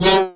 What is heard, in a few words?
Vinyl Fx; Sonic Foundry (Sony Creative Software); Esoterik Beatz; Vinyl101